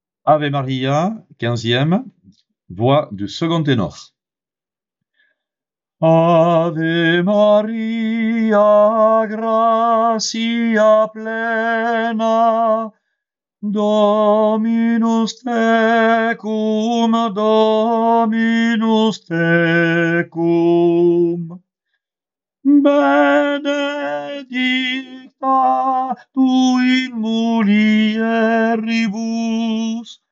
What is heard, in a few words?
tenor; Ave Maria anonyme XVeme